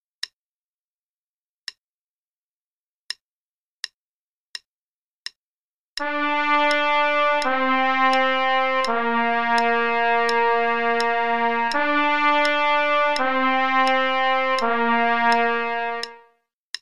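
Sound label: Play-a-long, quarter note = 84, trumpet; SOE Bk 1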